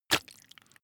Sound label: intestines hit